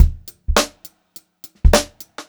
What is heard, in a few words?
Funk Groove Drumloops; USB Soundscan vol.38; AKAI; 90 s Sample CDs